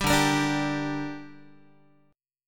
D Suspended 4th Sharp 5th down Guitar 4A,4E,5A,5D,5G sharp,6C sharp; strummed